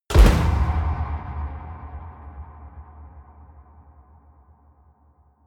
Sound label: door slam horror small